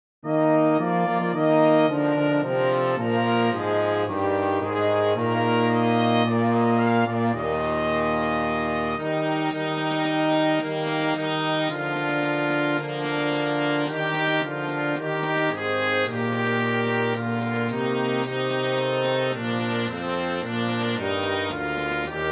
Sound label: Trumpet track with Melody; O Come All Ye Faithful